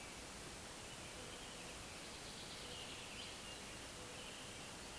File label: Fringilla coelebs